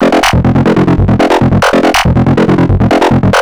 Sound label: Broken Up Arp; Electronic Point Blank